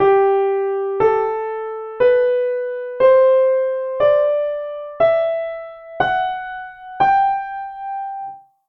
G Major ascending

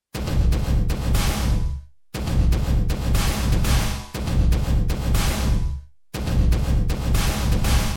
Basic Drum Gate Reverb

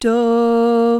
easy melody tonic

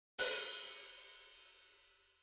hat open; Hi-Hat Cymbal: Open

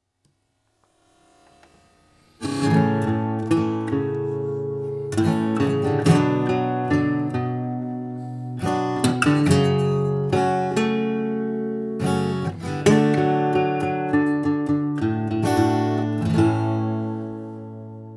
Triads; Playing through the full triads of Halo

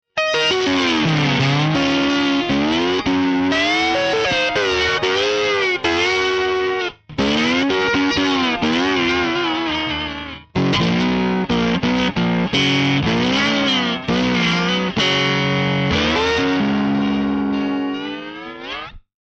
fender bassman loud and compressed

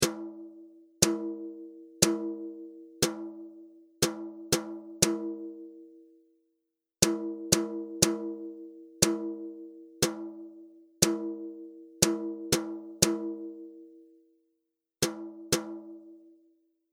RHYTHM

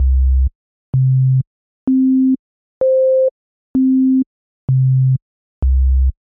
sine wave

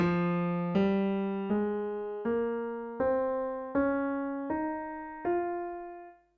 Scale of the introduction; F minor

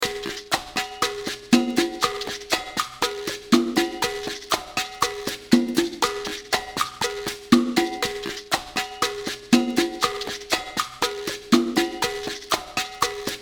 latin beat

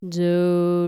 sung tonic

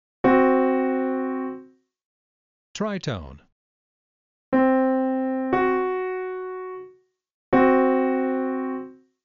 Tritone Example; interval

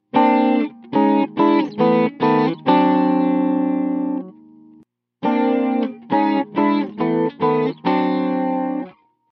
chorus

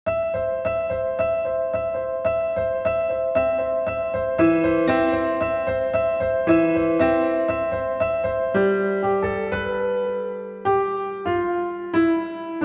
Improv C; Improv in C Major

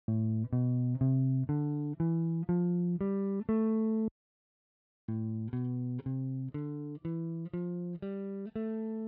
Pickups Clean, guitar tone

